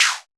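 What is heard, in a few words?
FX synth; Sound & Vision - Gigapack II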